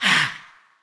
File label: Creature Attack Medium; Eydis